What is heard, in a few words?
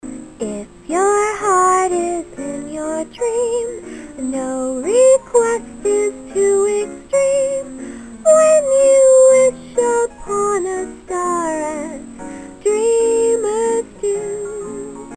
singing; A clip of me singing